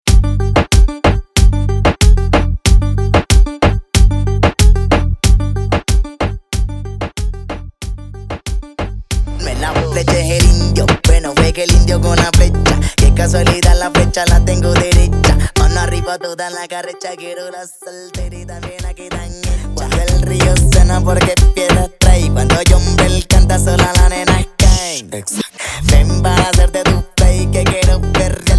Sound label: Intro Chorus 93 Bpm